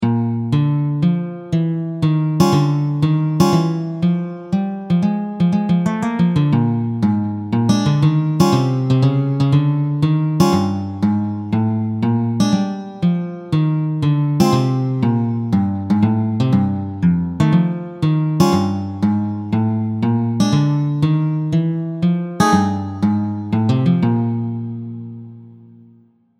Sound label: bass blues